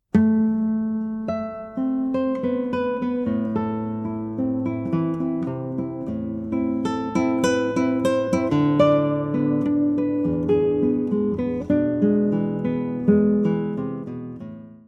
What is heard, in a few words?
meditation